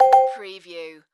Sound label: Multimedia Bell